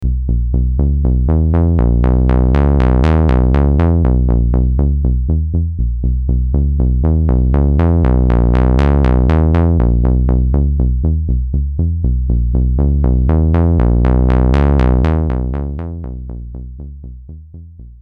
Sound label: seq bass EG and LFO fm